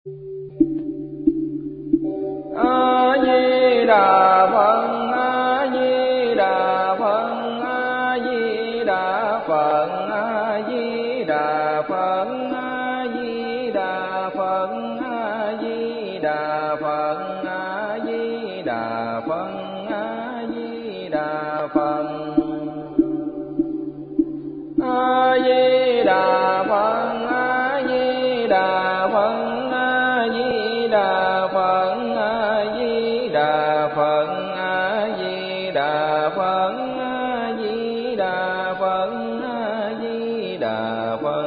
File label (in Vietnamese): Niem Phat 4 chu